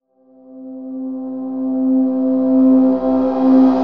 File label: Riser C; Vanquish; Ghosthack